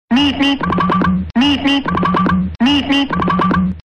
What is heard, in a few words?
bi bip beep beep